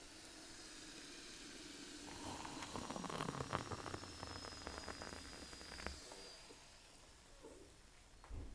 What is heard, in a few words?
Pouring Coffee